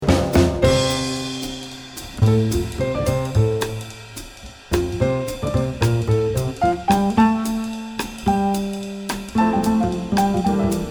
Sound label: Bebop